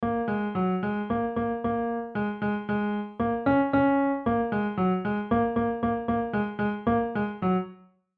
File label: Piano Marry Had, Piano Marry Had A Little Lamb; Cartoon Music; Blastwave